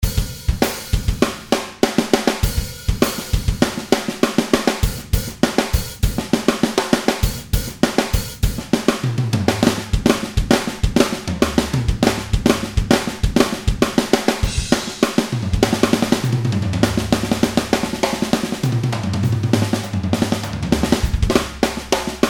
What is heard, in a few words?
Pop Rock Fills